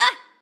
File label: Hurt; Jackie